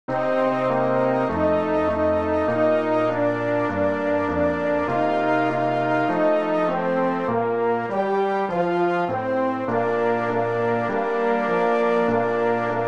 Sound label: jingle bells